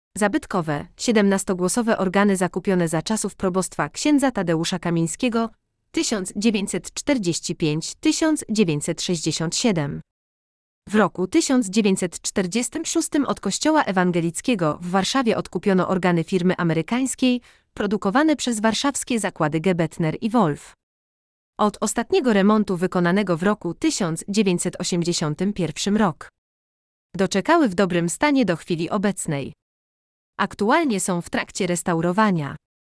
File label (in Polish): Organy